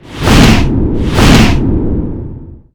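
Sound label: Dragon Attack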